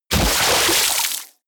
body fall water harsh splash; krieg-waffen; nahkampf; soundpool

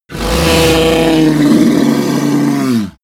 dragon roar; kreaturen

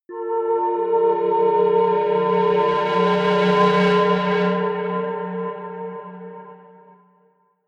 soft hum drone, drones

